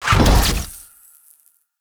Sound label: Execute Teleport; Warframe; PaxDuviricus